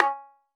Tabla Na; Roland BK-5; Percussion

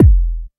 KICK deep, Techno Trance DRUMS; Techno Trance Essentials

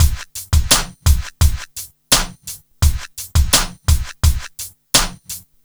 Smooth, R&B Drums

